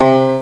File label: guitar pick; Sound Effects for Multimedia - Volume 1